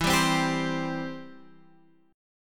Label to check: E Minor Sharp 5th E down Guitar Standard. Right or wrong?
Right